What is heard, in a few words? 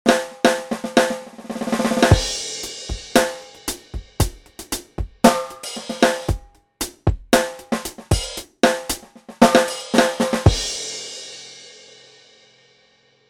Vinyl Groove